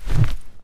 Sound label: z swipe, Zombies; zps; Attack